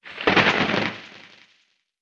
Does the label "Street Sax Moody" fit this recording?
No